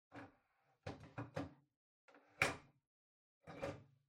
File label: Ceiling Light Far Tube Remove